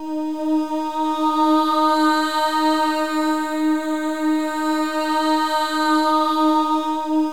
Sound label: MOVING V4, Symphony of Voices; Spectrasonics